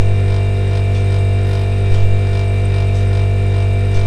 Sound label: drone; gesource